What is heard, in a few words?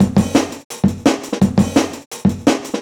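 Jungle Breaks, 170 bpm; Grits; Original Jungle Breaks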